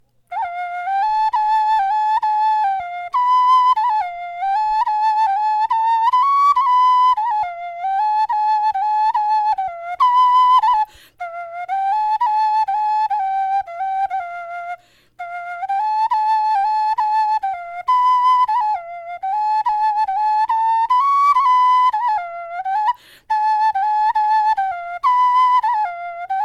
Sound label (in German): melodie, knochenfloeten